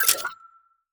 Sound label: Menu Weapon Assemble; Custom UI Sounds; Call of Duty Modern Warfare 2 (Campaign Remaster)